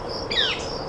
Northern Flicker; ibm glen

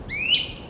Great Crested Flycatcher; ibm glen

